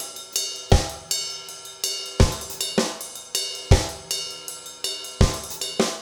reggae 80

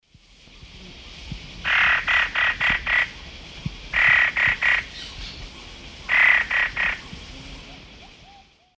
Taraba major, call; S. America; Eastern Lowlands

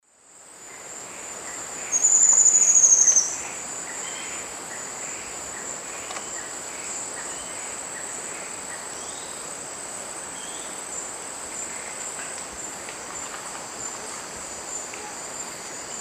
Epinecrophylla fjeldsaai, song; S. America; Eastern Lowlands

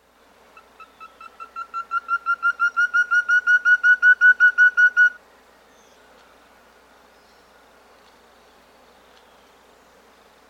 Black-Headed Antthrush; S. America

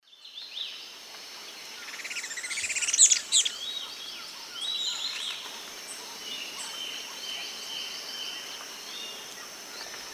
Xenops milleri, calls pair; S. America; Eastern Lowlands

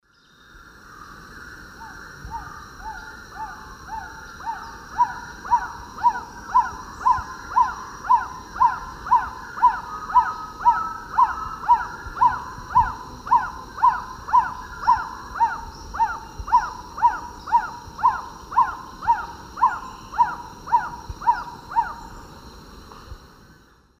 Trogon melanurus, song; S. America; Eastern Lowlands

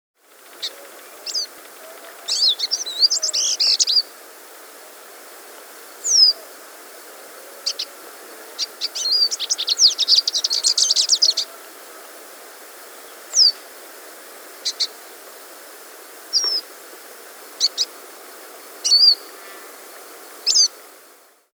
Olivaceous Siskin, Carduelis, S. America

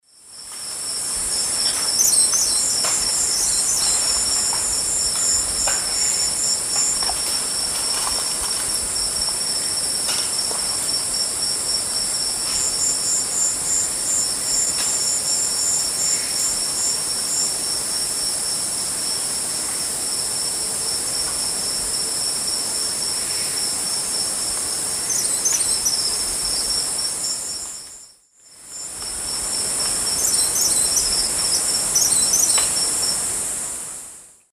Phaethornis bourcieri, song; S. America; Eastern Lowlands

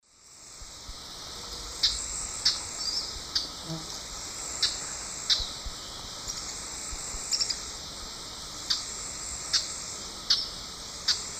Furnarius minor, call and song; S. America; Eastern Lowlands

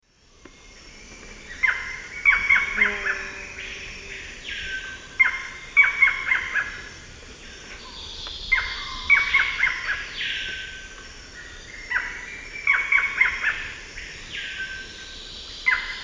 Micrastur ruficollis, 5-noted song; S. America; Eastern Lowlands

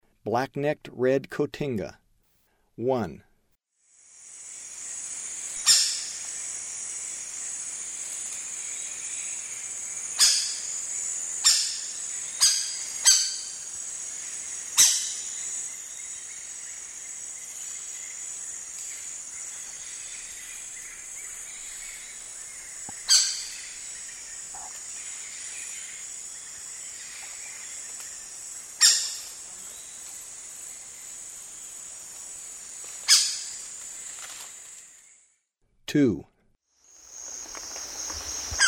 Black-necked Red-Cotinga; S. America; Eastern Lowlands

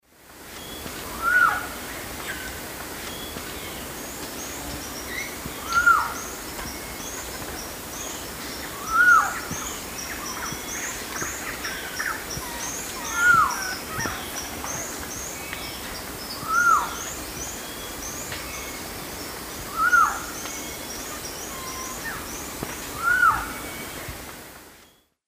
Pharomachrus pavoninus, song; S. America; Eastern Lowlands

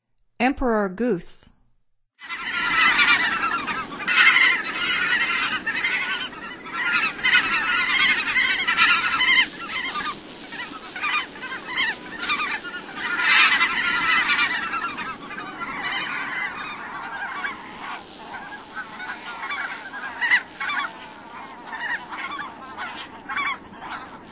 Emperor Goose; North America; Stokes

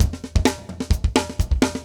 metal

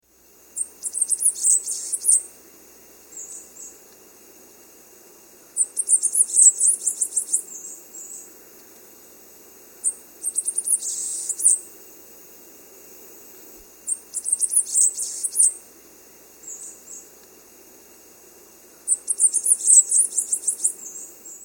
Bananaquit, Nature's Songs, S. America

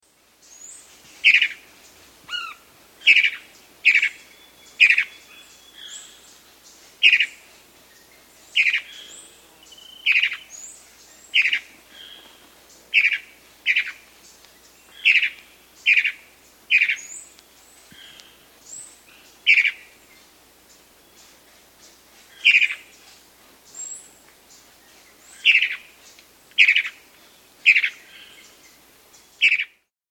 Bicolored Antvireo, Nature's Songs, S. America

